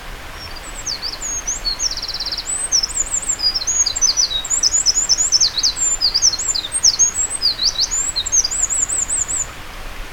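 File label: misosazai, wren; bird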